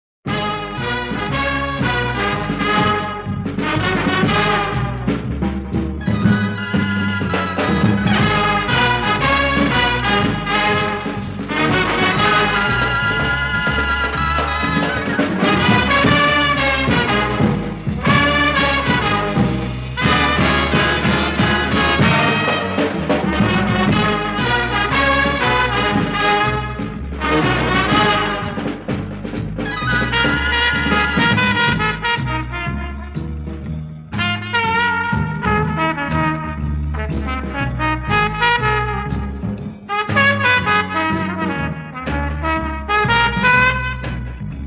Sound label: Title Music; Vakil Baboo